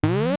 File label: Pause Open; Open and close the pause menu; LTTP